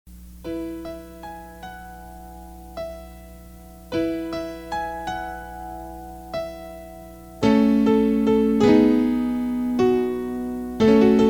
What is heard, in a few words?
Jesus You Call Us; ENG